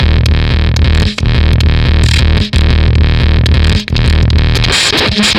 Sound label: Fuzztest drum fuzzfactory